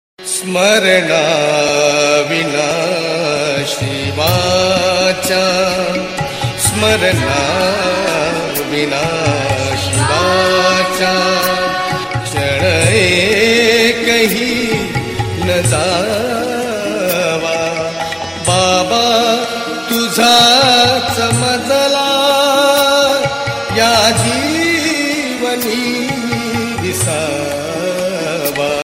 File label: vina ringtone